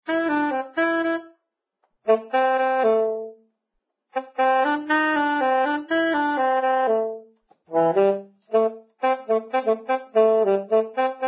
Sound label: Saxophone; Zomboid; Lifestyle